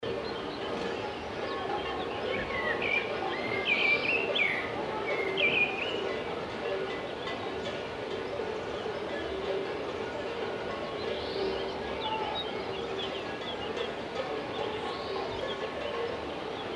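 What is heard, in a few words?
cowbells, field recordings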